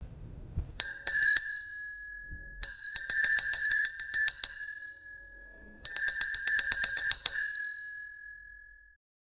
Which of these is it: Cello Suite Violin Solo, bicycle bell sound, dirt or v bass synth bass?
bicycle bell sound